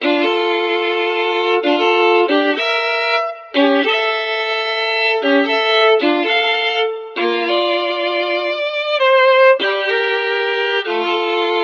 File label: strings haunted, Sad Loops